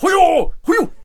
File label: Hwacha Attack Vocals